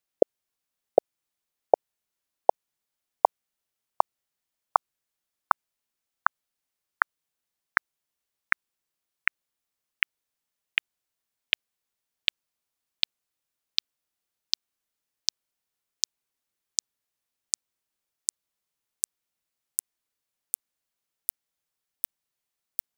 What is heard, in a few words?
4-Cycle Burst 500 16kHz 750ms 1/6 oct spaced; test signals